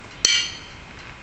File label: Horseshoe Ping